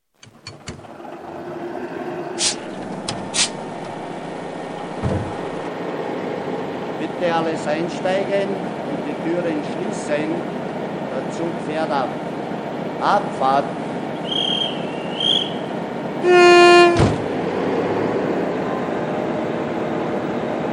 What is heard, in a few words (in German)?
Hoerprobe 5042, Loksound; Roco; Loksound H0 AC; OEBB